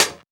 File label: Percussion